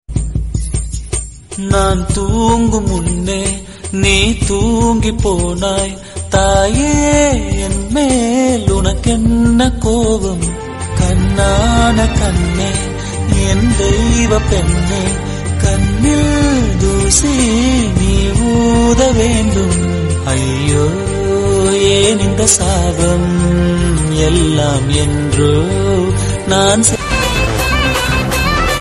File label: tamil amma song ringtone; VIP